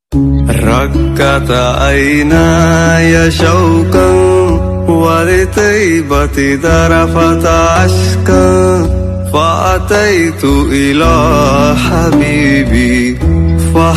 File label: naat ringtone islamic ringtone